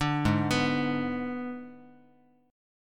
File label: Ab Diminished, Guitar; Abdim arpeggiated; JGuitar